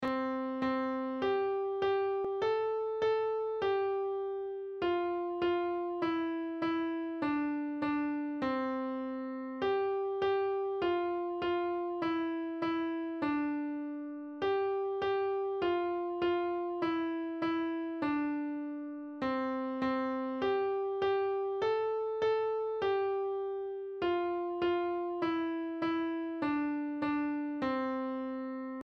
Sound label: Twinkle Twinkle Melody piano; twinkle little star